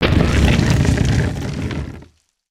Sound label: Pillar Crumble